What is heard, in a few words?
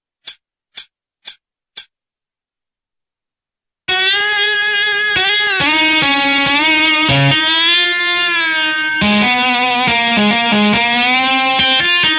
solo, guitar